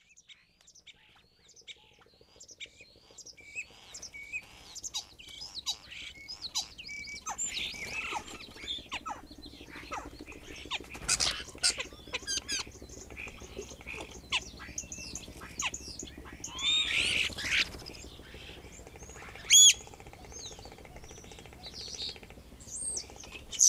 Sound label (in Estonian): kuldnokk